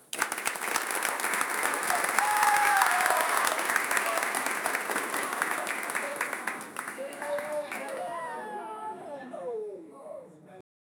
a crowd clapping loudly at the end of a performance